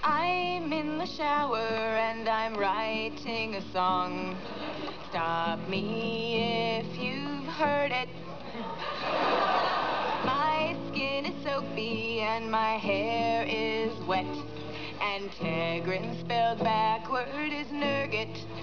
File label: shower